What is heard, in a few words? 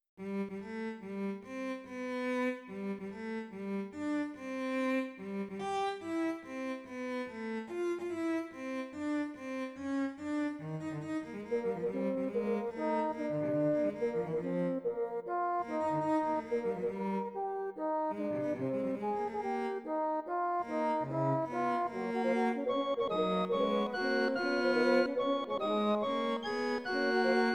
Happy birthday 68edo canon all parts